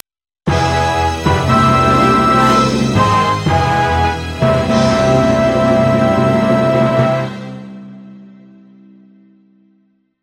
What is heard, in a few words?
Victori; music; Pirates Of The Caribbean